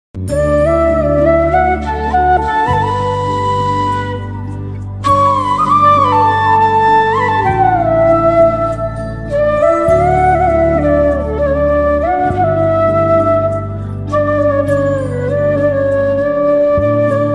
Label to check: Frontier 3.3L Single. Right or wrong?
Wrong